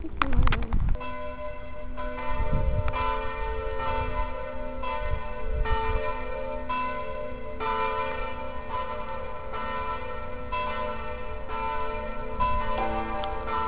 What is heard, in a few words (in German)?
glocken